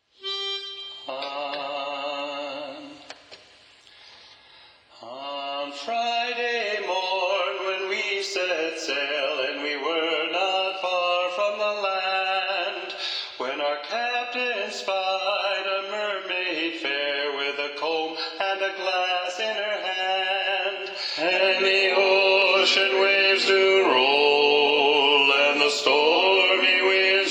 Bass Predominant, sea shanties

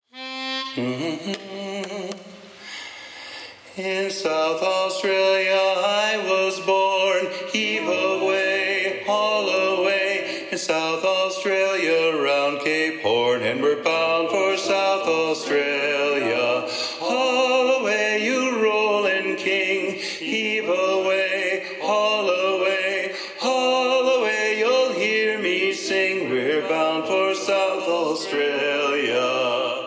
Lead Predominant; sea shanties; Bound for South Australia

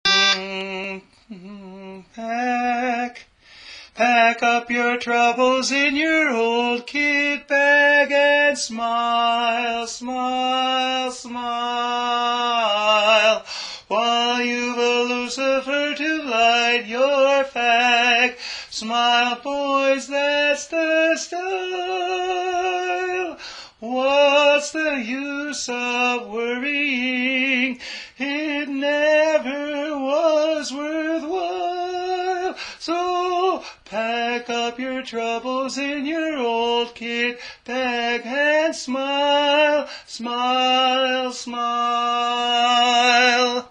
Tenor; for god & country music

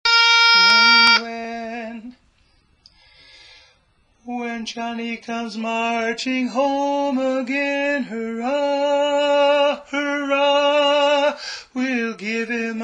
Tenor; for god & country music

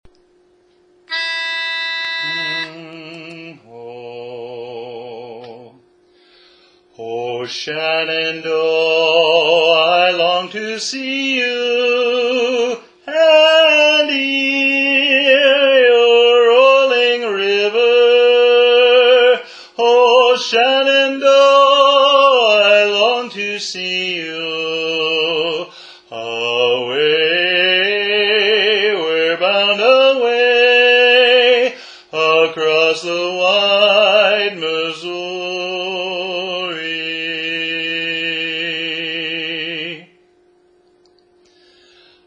Lead; Shenandoah; learning tracks

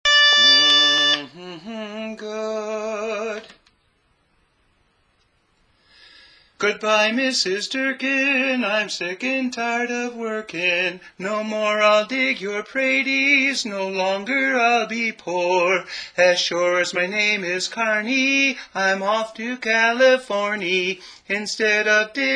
Tenor; learning tracks

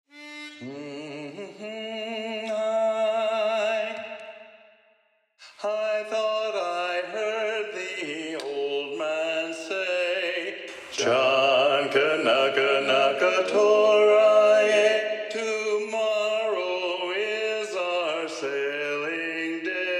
Bass Predominant; sea shanties; John Kanaka (Don Kanaka)

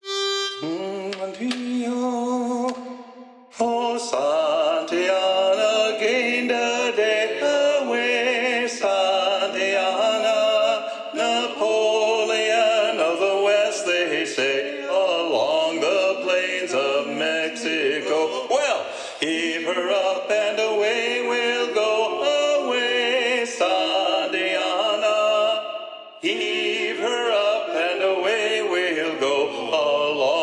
Santiana (Lead Predominant, sea shanties

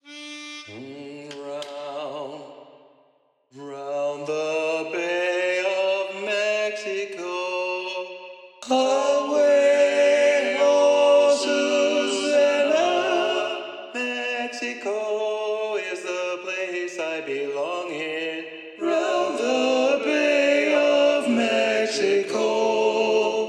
Tenor Predominant; sea shanties; Round the Bay of Mexico

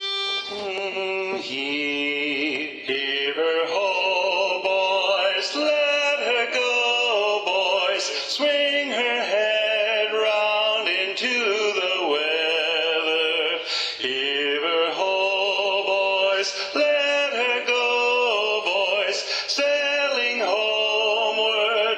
Lead Predominant, sea shanties

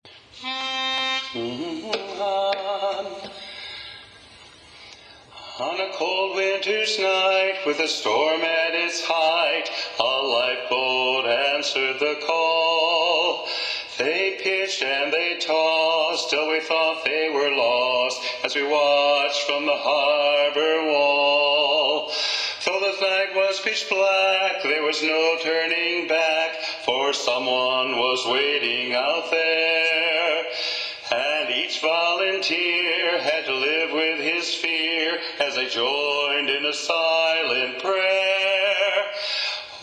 Lead Predominant; sea shanties

